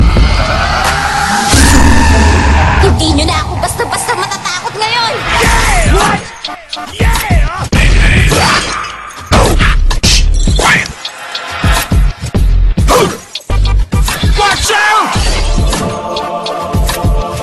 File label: Sound Effects Mix